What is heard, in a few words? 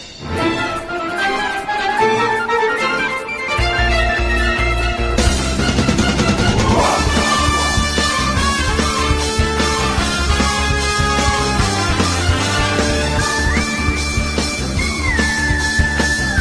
Background